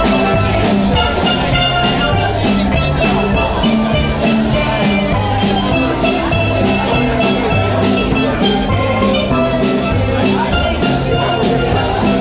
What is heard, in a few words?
Xmas Eve Band; Bequia; windward